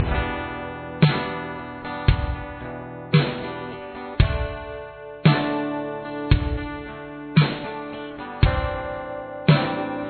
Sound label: ending